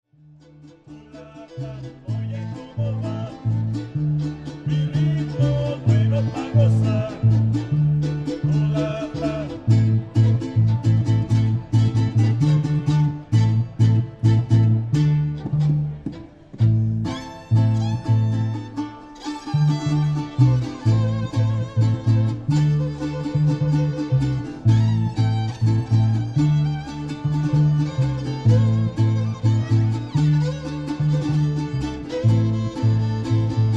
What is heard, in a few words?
Mariachi; Minneapolis